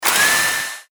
中型ロボットの駆動音

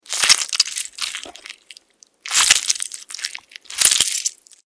クリーチャーが食べる音

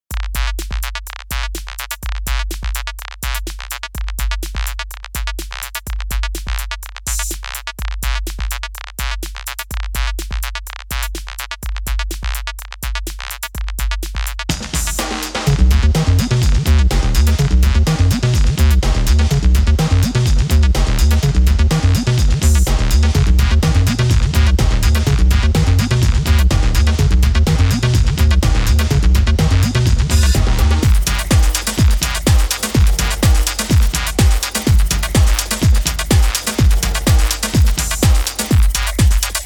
テクノ

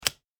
カード を 置く

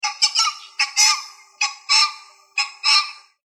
キジ

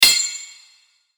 剣ぶつかり合い

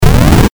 8bit ゴリラ

